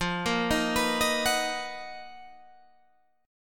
B Major 7th F, chord; Bmaj7/F; arpeggiated

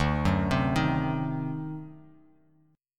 D 7th Flat 9th D down Bass Standard; D7b9 arpeggiated; JGuitar

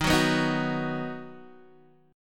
Eb Minor Sharp 5th Eb down Guitar DADGAD; Ebm#5 strummed; JGuitar

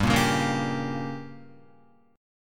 G Diminished, Guitar, chord; strummed; 4F,4A,5C,5G,5C,6E; 2,1,1,3,1,3; JGuitar

